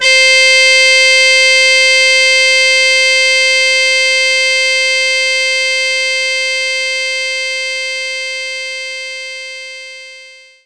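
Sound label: mute trumpet C5; knights try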